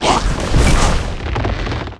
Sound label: gargoyle dead, monster